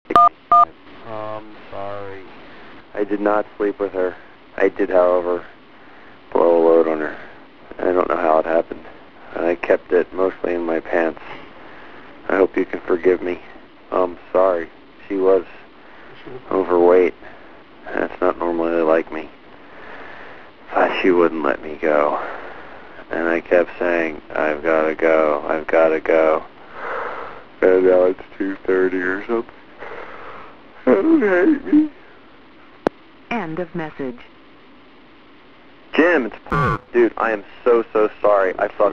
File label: Drummer